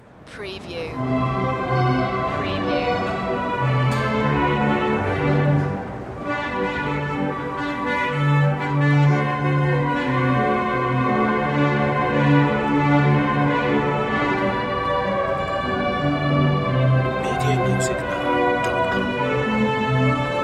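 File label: Merry Go Round; Game Sounds / Amusement Park / Arcades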